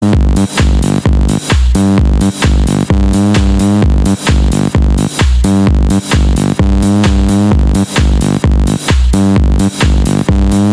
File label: hard hip hop music tone